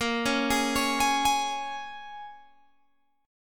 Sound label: A sharp Minor 7th, A sharp, down, Guitar, Open G